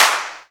CLAP, Dance Drums; Best Service ProSamples vol.15; AKAI

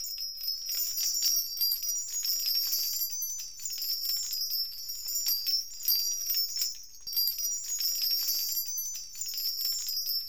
BELLTREE

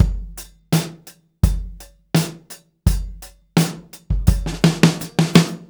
Testos 084; Zero-G; Monster Beats

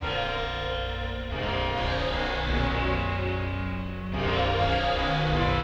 PAD, Hip Hop and R&B Drumloops; Best Service ProSamples vol.01; AKAI

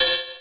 hat, drum; Masterbits - Total Zone

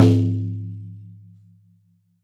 SYR BASS, HAND DRUMS; SoundBytes - Early Patches

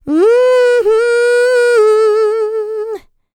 LICKS, Vocal Planet, R&B; ILIO; Dance; LT R+B

